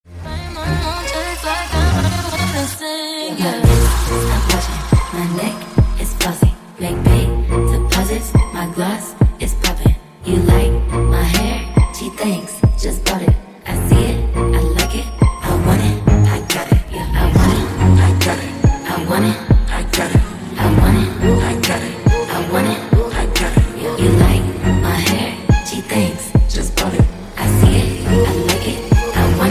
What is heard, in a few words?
scary version